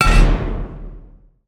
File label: Shield; portal quest; android; Necromancer Skill2